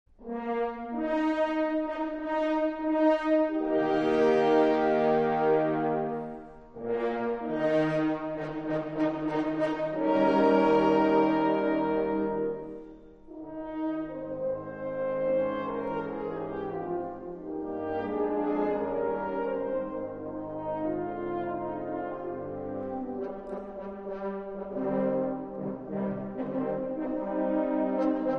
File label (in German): festfanfare